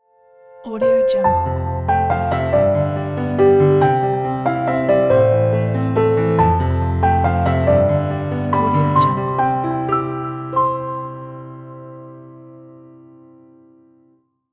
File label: piano logo